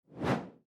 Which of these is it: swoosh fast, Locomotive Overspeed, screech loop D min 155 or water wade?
swoosh fast